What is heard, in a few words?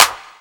clap sound d sharp key, Royality free clap single shot tuned to the D# note. Loudest frequency: 4235Hz; percussion; single hits one shots